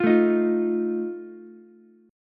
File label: E flat Suspended 2nd Flat 5th, Ukulele; strummed; chord; JGuitar